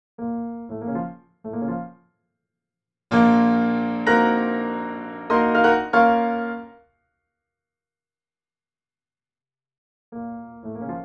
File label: reverb